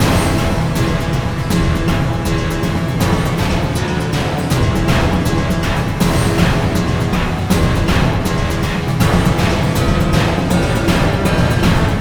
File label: Boss Bkgd